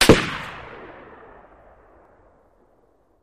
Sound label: Gun Shot Sngl Ext